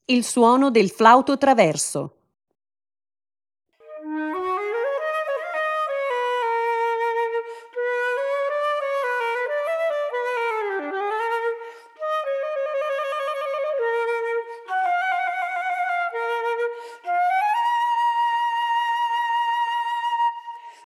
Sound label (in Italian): flauto traverso